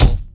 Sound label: Nuke Flash; Worms Armageddon